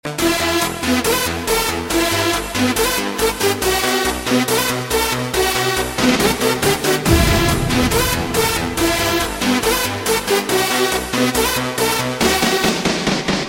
Techno

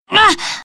Pain

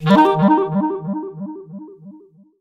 New Quest Alert

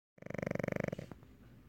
Pug snore

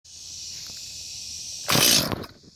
Chainsaw choke start

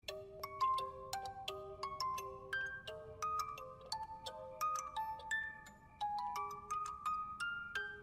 Music Box; Tiabeanie

